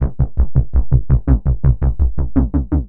Synth Bass; Sonic Foundry (Sony Creative Software); Electrocution

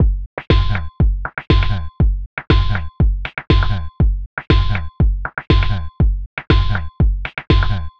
120 trance beat, Drum & Perc Loops

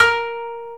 KOTO A#3 -R; USB Soundscan vol.60 - Asian Traditions; AKAI

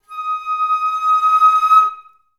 FL CRSC D#5; Best Service

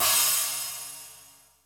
CYMB; Crypton - DrumBase5000; GO GO DRUMS

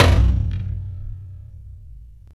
Foley lo; layering

